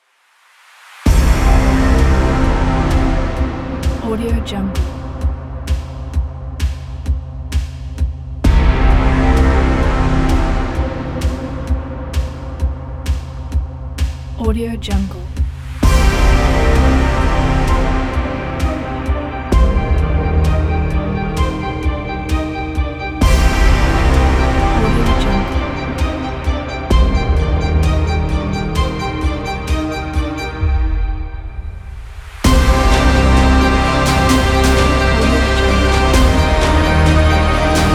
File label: cinematic trailer intro